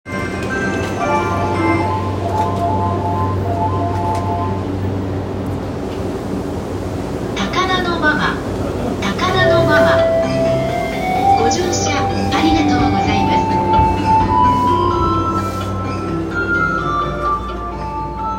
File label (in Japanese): JR 山手線 高田馬場 CCW, train sounds